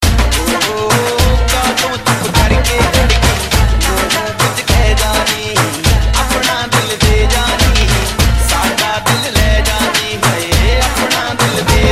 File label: punjabi ringtone